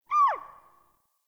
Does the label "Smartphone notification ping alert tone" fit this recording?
No